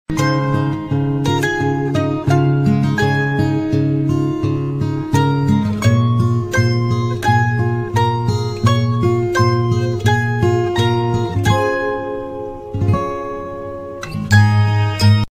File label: cute alarm ringtone; android